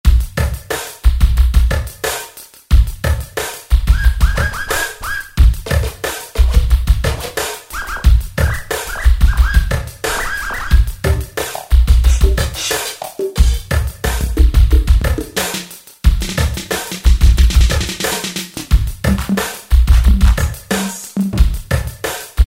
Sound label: kit, E-mu PK-6; KastOff